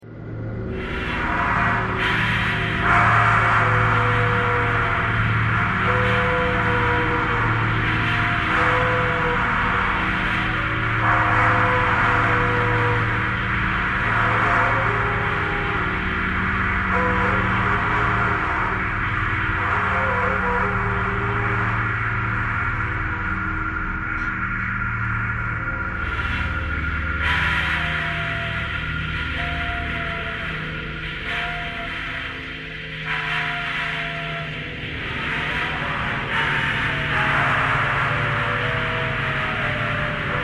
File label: thread buzz